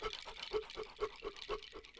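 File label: Music Village Calm Near; from dust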